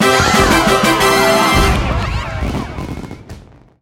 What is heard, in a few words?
bubble league up